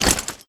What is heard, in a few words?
Orc Clothes